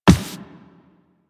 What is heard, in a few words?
Walk Default, Footsteps; Warframe; Operator